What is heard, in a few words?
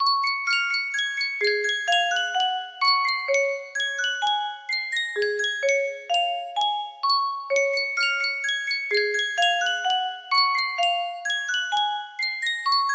music box